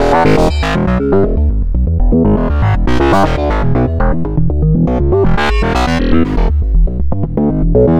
Synth Bass

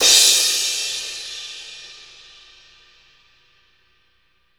DUAL CRASH

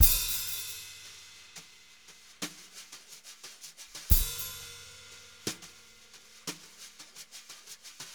jazz brush loops

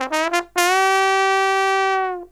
TROMB, SOLO TB, Killer Brass Riffs; USB Soundscan vol.29; AKAI

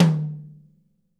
mid tom

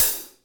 HATS SEMI, Drum Kits

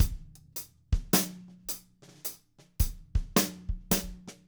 107 SLOW, drum

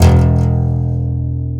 ContraBass Balalaika E1; Multisamples; Digital Sound Factory Planet Earth

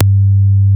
Moog G2, analog bass; Music Store - Claudius Diemeter Schwerlos

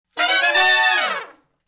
Fast Climb Up (B) har, Horncraft for R&B; Crimson Blue and Fabulous Horncraft 4 RnB; Sections

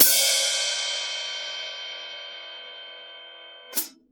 Drums Acoustic, RAW KIT; AKAI; 90s sample CD; USB Soundscan vol.10; RAW KIT 6

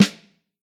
snare drum, vintage snare; 6X14; Q Up Arts; Johnny.C's Vintage Snare Drum

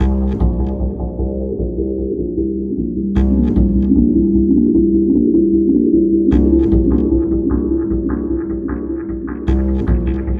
Remixed Acoustic Bass

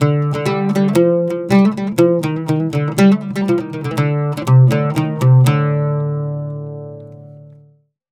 120 OUD, Mediteranean Traditions

